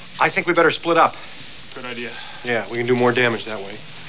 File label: ghost; "I think we'd better split up. good idea. We can do more damage that way."; Ghostbusters